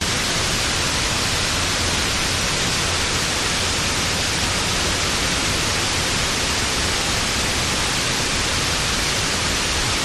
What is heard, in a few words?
waterfall lateral right front close; bridal falls; your free sounds